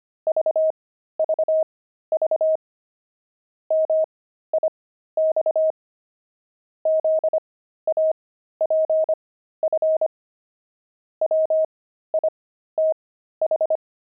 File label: pangram, intermediate pangrams files